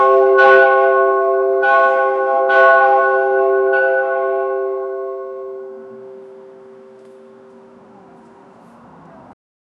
a huge hammer hitting a, bell

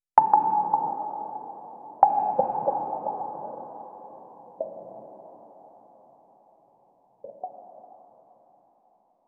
a single water drop falli

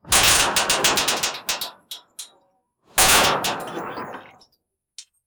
metal platform charging

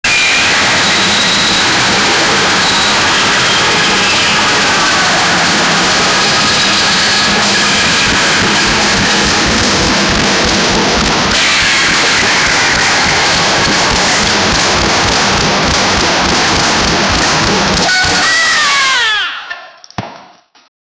building sound, banging; fortnite

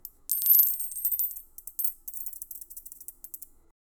a gentle soft sound of, foley

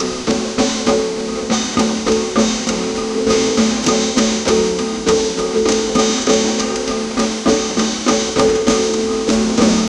mridangam

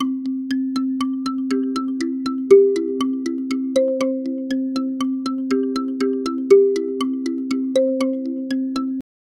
looping soft pluck in C

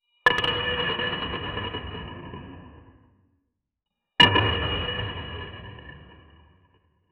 pizza splat sound

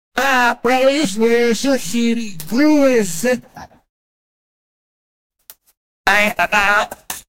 the most beauty timbre in

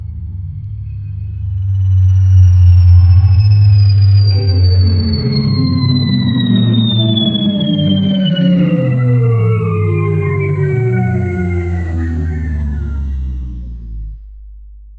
fortune wheel spinning th, casino

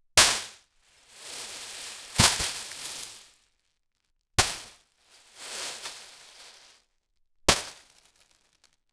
pile of heavy sand; material

